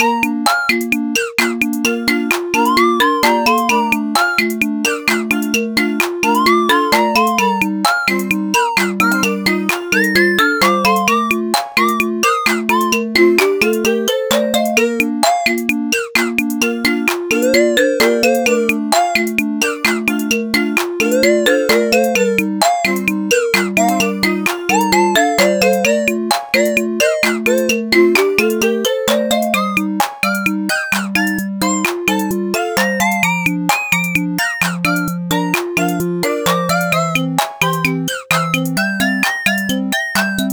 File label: Meow (Drums)